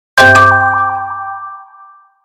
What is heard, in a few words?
Logo Hit Mallet Delay Melody; Sonic Logo; Blastwave